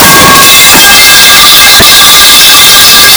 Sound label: the loudest sound in the universe